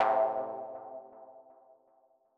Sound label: M Mono DBeat A2 V127; KG MegaSaur